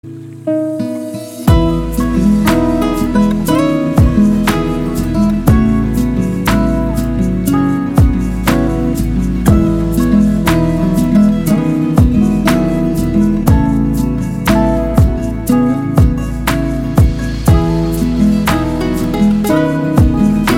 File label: Lofi Remix; MIUI12